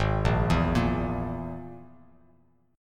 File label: Ab Suspended 2nd Sharp 5th, Bass Standard; arpeggiated; 4,1,2,3; JGuitar